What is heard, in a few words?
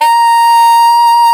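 saxophone alto fortissimo; Roland - LA Composer vol. 1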